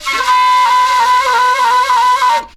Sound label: FLU + VOC, FLUTE + VOCAL